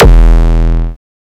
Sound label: kick oneshot lilmonster E; GhostHack